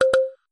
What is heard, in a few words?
vb error